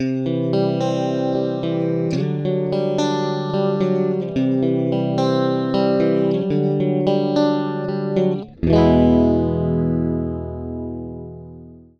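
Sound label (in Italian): phaser chorus, Esempio catena phaser e chorus, chitarra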